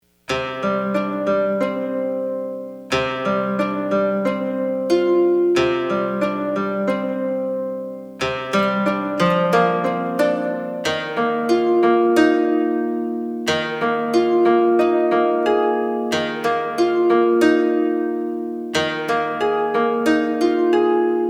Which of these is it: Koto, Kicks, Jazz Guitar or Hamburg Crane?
Koto